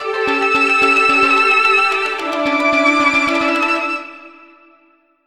mail; Kyocera; Cadence-LTE

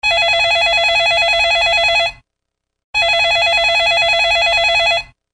Ringtone, Samsung GT-C3110

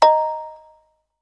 Tapping, notifications; Meizu X8

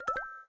raindrop send; Samsung SGH-i617 BlackJack II

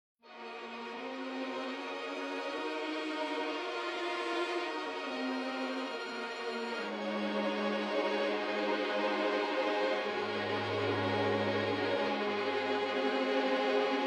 Orchestral background music

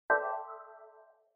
Slide open; Audio themes

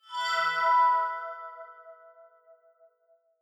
standard notification; Razer Phone

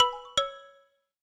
Squirrel, notifications; LG Wing 5G Verizon

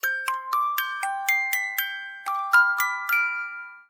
Music box, ringtones; Meizu X8

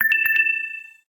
Low Battery

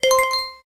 Xylophone

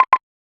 Wood, notifications; Realme 8

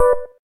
gayageum, keytone; SKY IM-A850S-VEGA-R3

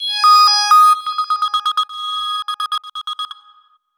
ringtones; HTC; One-M7

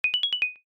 taptastic, notifications; HTC One-X

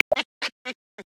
Chacma Baboon; Xiaomi Redmi-Note-8-Pro; Africa

